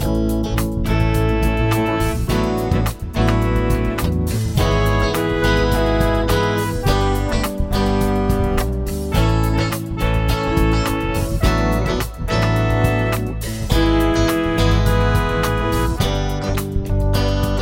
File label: chord prog with mixture; harmony